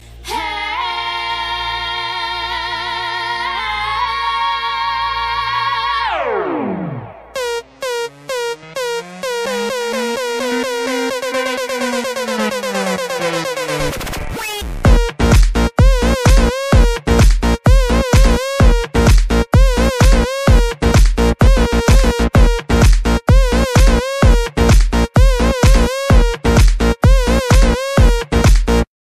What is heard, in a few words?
House; Albanian